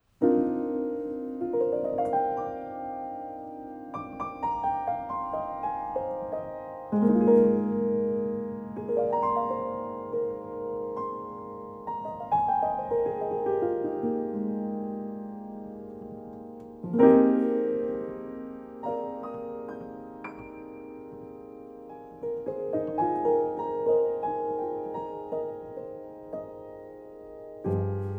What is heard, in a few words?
lullabies; prescription for sleep game music lullabies volume i; Donkey Kong Country